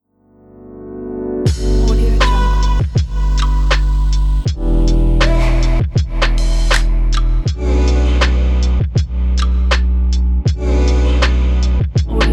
lofi chill beat

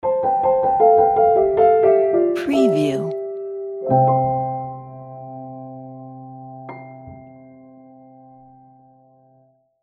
piano logo

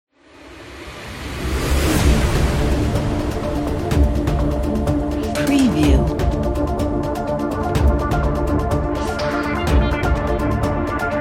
hybrid orchestral opener

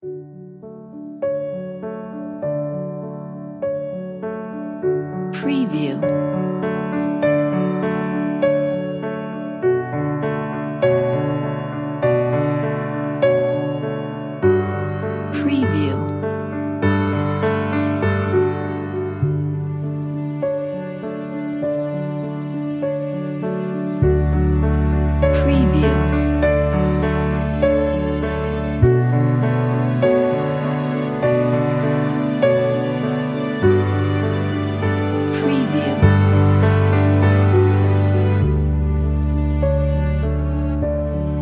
romantic piano

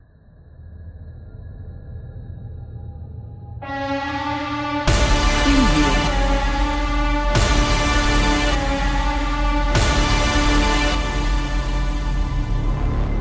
alien attack trailer

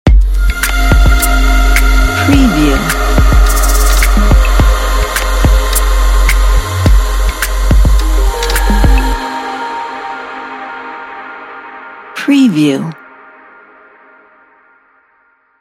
atmospheric logo reveal

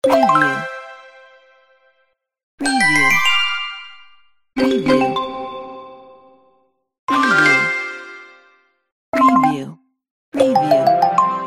instant win pack

